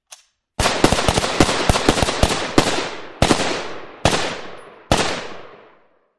HK-91: Semiautomatic Fire; Gun Cock; Followed By Sporadic Rapid Semiautomatic Shots With Shells Falling

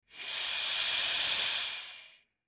Steam, releases

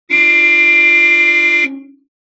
Horn Honk Thin Reedy

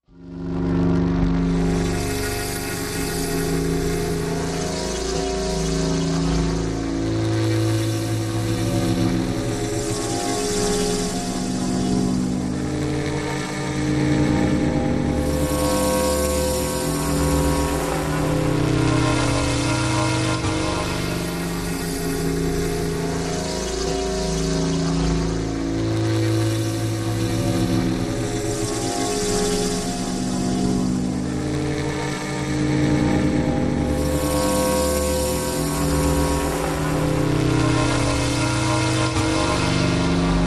Hydro Hunter Machine E, electrical

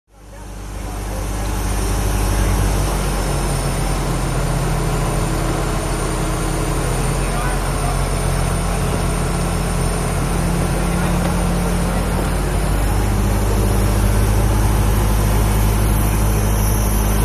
Hydroplane Dock cra

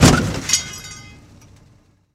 Metal Impact Metal, metal impact

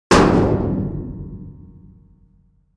Dumpster Type 3 Hit with Sledge Hammer

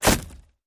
Bullet Hits Wood Soft

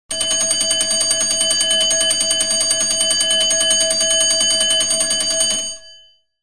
School Bell Metallic

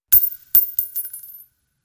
Shell Case Sngle Tube, shell casing movement

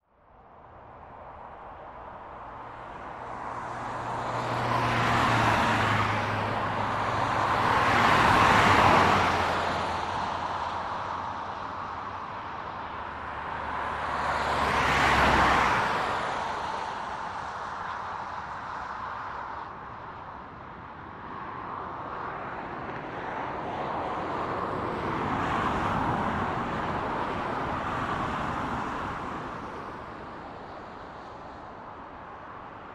Highway Traffic Close; car & truck bys

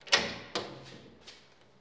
Jail Door Click Ligh; jail & prison doors

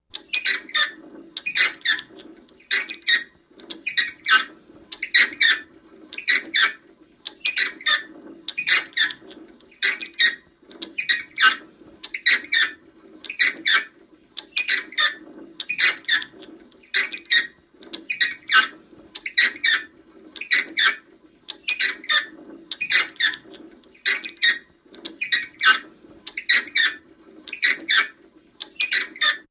Squeaky Wheel Rust

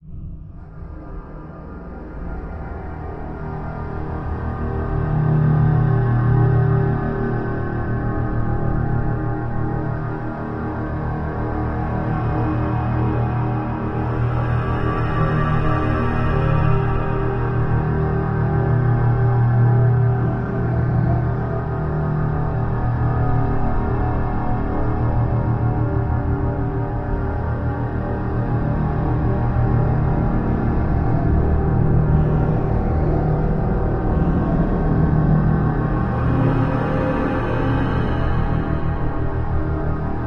Tidal Deep Resonant Hum

